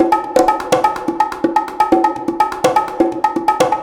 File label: Bongos A; Сэмпл перкуссий; 125BPM; loop; percussion; БОНГО; Big Beat; Бигбит